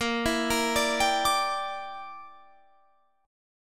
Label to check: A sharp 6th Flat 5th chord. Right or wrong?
Right